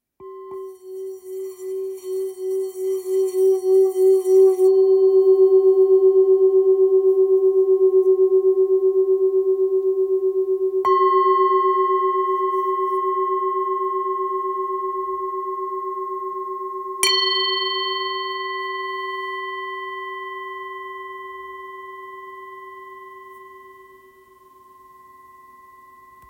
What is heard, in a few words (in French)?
Bol ancien sol